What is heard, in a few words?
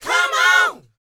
DANCE 120, Vocal